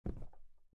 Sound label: step wood